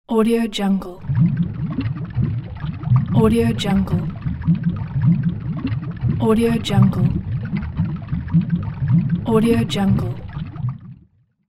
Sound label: bubbles